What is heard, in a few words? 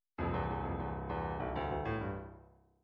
midi fills rock